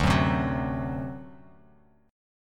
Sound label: D 7th, down; D7 strummed; JGuitar